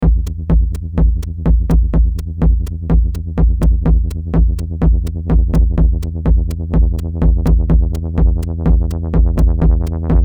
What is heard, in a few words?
LFO Filter Modulation; L.E.P. LEPLOOP V2